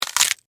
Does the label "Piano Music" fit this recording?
No